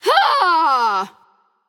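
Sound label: battlecry, female; smite; voicepack; hera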